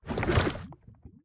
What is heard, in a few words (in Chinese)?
炽足兽熔岩上行走声